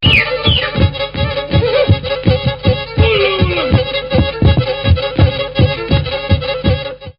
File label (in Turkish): kemence